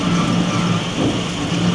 pump; SGI O2 Out of Box Experience 2.2; texaco

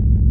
wheel; Machines

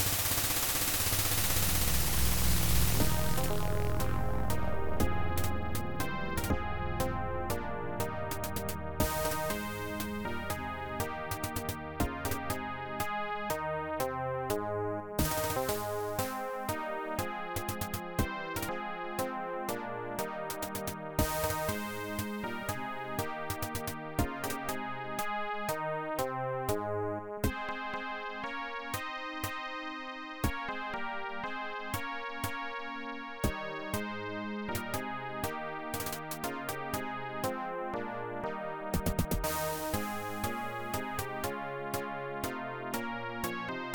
anthem

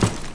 generic, impact debris; SiN

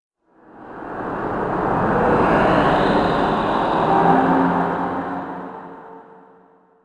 city, zones; Zoom3City

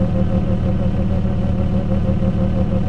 wave; ENGLISH; Far Gate

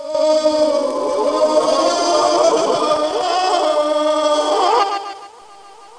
HUMAN; Reflux

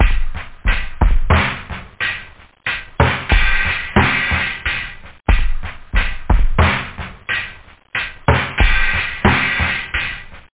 basic, loop, house; da capo vol1; instruments

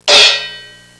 cymbal choke; Sound Effects for Multimedia - Volume 1

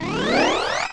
Sound magic

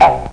Boing; Internet Pratique 01

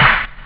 rave, drums snare; OctaMED SoundStudio V1

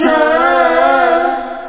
vocals; adlib "aahh"

